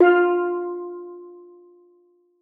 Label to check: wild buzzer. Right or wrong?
Wrong